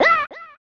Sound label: Kill Small Enemy; tin star; snes